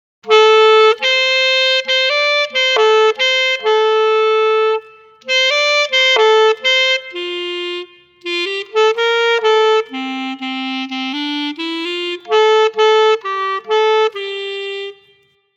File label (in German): Klarinette